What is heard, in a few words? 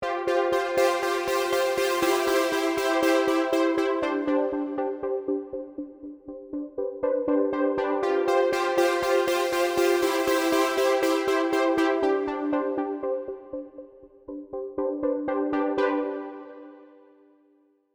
arp